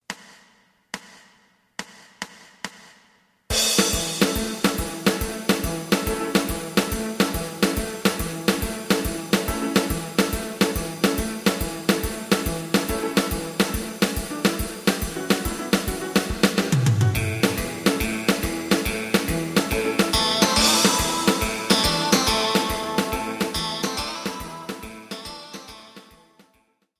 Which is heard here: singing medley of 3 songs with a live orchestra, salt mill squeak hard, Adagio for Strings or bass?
bass